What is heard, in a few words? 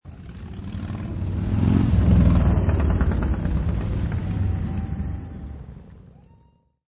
Motor Cycle Drive By Right Left, Motorcycle drives by; Vehicles; Motorcycles